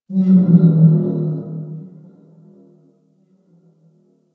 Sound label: Exterior Distant Calls, Ambience; Warframe; Duviri